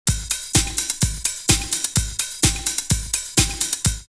Clave, drumloops Techno House 127; ClaveSlave; X-Static Goldmine

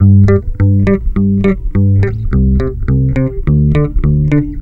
bass, Roots Funk; USB Soundscan vol.41; AKAI

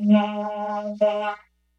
VOCODER FX, VOCAL BITES; Kid Nepro - Elite

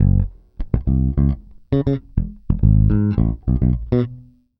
Pop, Bass

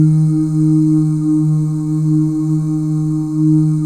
BGV OO; Spectrasonics; Symphony of Voices; BGV OOS 2

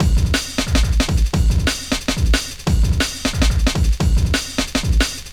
Dope Break, Drum & Bass; Fluid Dynamics

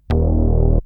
Synth hits and riffs

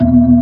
HAMMOND G1, organ; AKAI S-Series CD-ROM Sound Library VOL-2

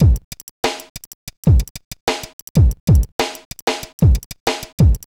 Beat

